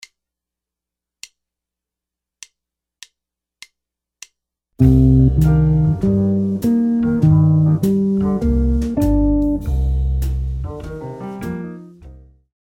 lick, jazz guitar

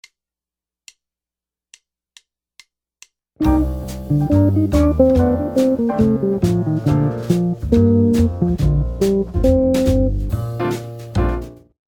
lick, jazz guitar

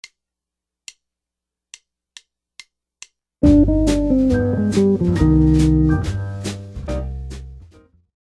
licks

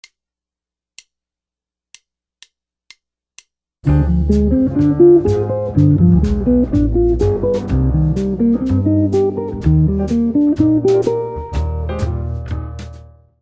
minor ii V I arpeggios, guitar

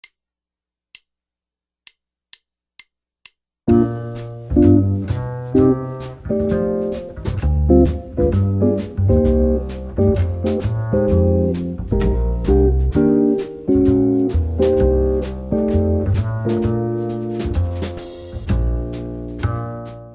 rhythm changes, jazz guitar

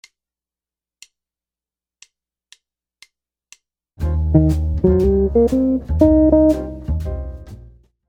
lick

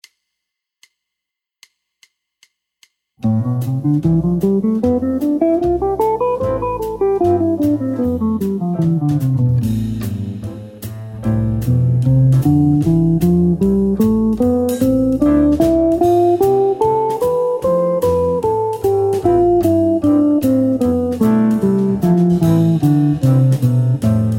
aeolian guitar mode

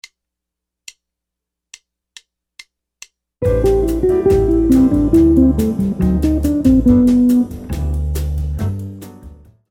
brazilian jazz licks, guitar

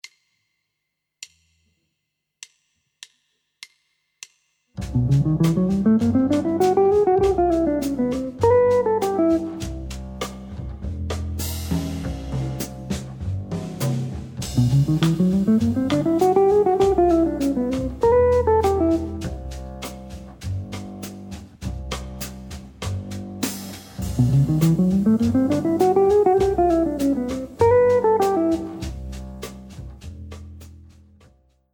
lick

